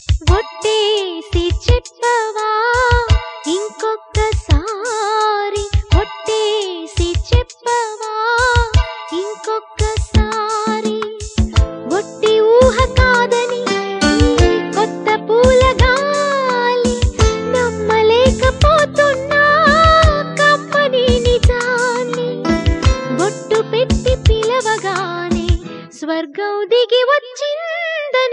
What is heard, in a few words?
Telugu Song